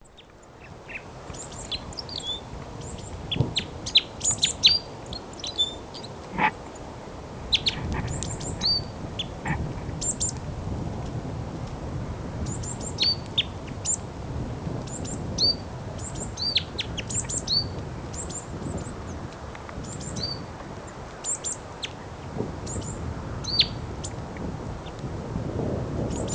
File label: Red Crossbill